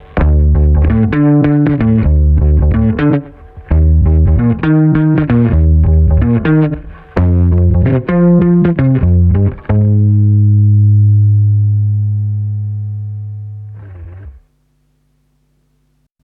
Bass 10 1 Ratio 1 ms Attack 3 ms Release 6 dB GR Peak Mono; VSC; SC Filter Off